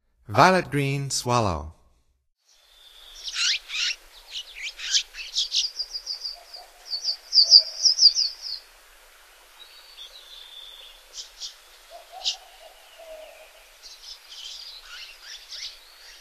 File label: Violet-green Swallow, Songs of Birds of North America; Stokes